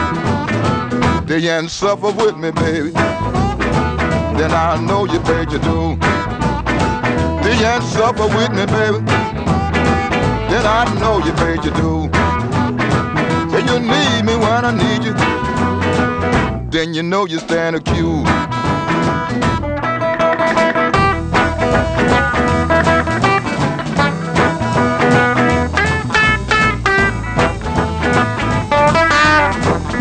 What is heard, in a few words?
blues